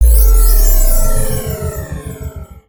Armor Heal

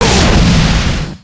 Dragon fireball; creatures organic

